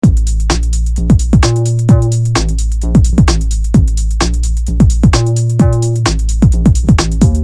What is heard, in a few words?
depressing rock music